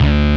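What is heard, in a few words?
DISTORTED SYNTH BASS D-2; AKAI S-Series CD-ROM Sound Library VOL-7; STD SYN BASS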